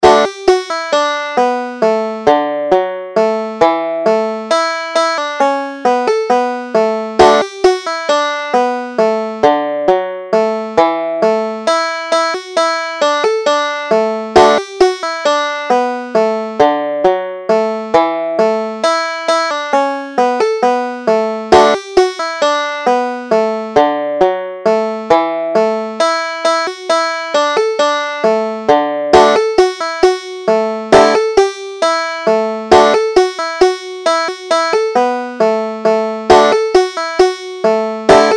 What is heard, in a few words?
dennis murphys polka